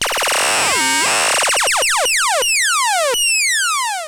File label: Monotron Long Lazers